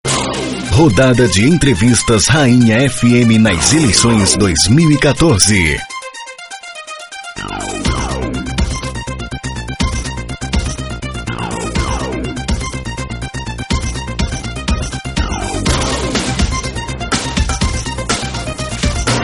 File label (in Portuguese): vinheta